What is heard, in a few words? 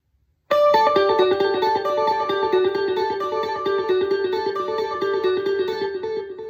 Dotted 8th Delay Patch, nux mg 30